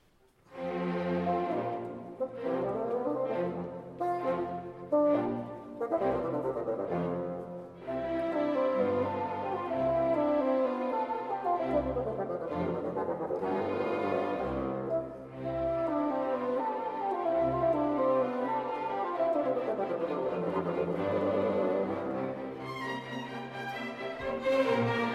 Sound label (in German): Fagottkonzert dritter Satz; Weber